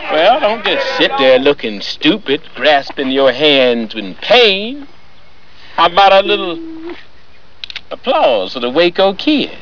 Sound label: applause